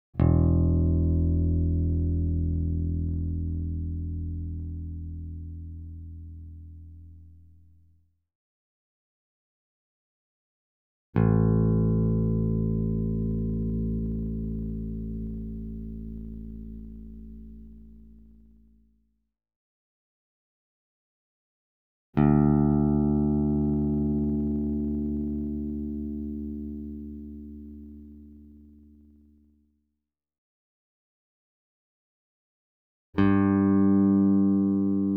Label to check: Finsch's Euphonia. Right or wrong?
Wrong